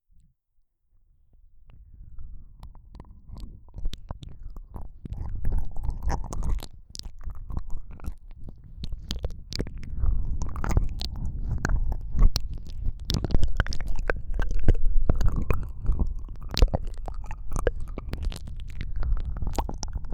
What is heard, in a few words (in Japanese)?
耳舐め(両耳舐め）, ASMR